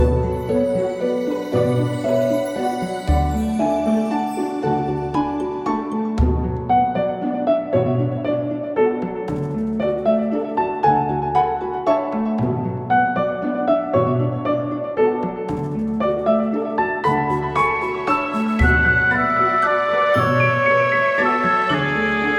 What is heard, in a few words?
crystal forest BGM loop; Eternal Radiance